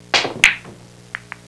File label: POOL